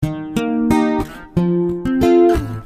Punchy Eb,F 90

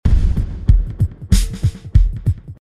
Peppy Driver 95

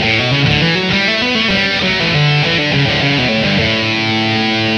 lead, 100

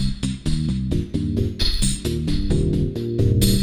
Funky 125-132 Cm7

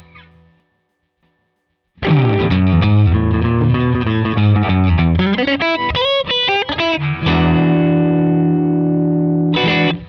Outro Boogie E; Loops

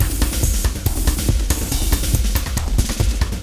Wild Ride 140

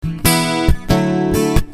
Punchy E,D 138

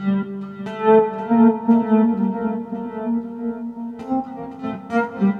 Bass Amb Vol G 111

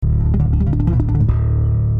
Bass Slap D 121

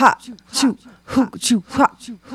Vocal Echoes

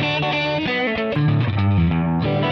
Lead Boogie E; Loops

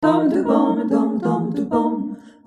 98 Jazzy Harmony

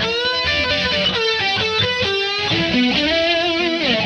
E5 134 rhythm

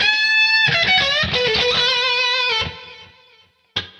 lead 122

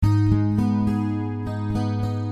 Picking Ab 55

